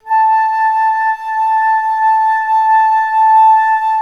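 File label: flute legato piano A4, Orchestra; Best Service; Peter Siedlaczek's Advanced Orchestra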